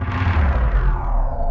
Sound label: big powerdown; Weird and Interesting Hits; single hits